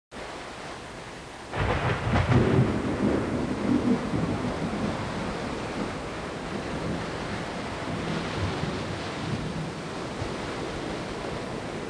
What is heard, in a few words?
STORM; ambient; weather